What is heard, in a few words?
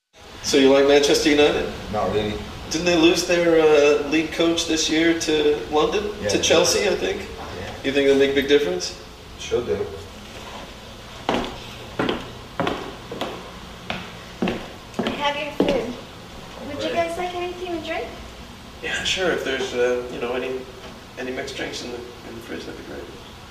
Latin Lust